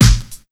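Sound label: Big Beat Drumloops, snare drum, left; USB Soundscan vol.25; AKAI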